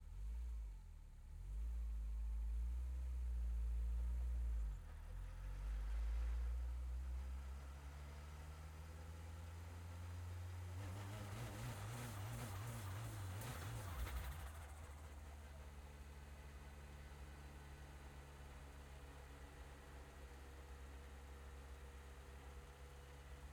Citroen C3 R5 WRC2 - ONBRD - STEADY RPMS - Drive - ENGINE; Pole Position; Citroen C3 R5 WRC2 2019